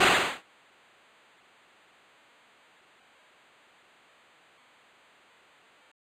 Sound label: pam